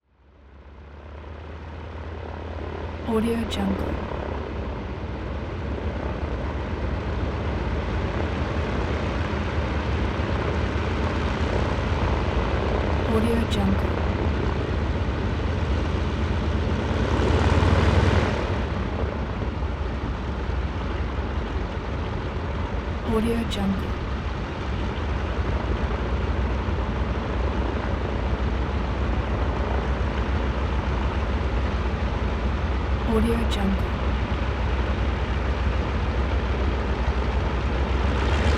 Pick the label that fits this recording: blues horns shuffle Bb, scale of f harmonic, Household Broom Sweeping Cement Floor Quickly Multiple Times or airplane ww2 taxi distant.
airplane ww2 taxi distant